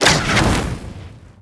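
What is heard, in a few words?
weapon; guarche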